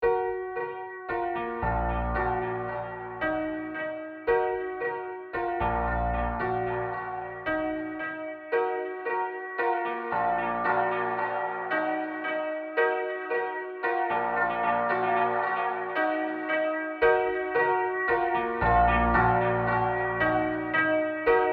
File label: piano processed